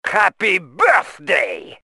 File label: happy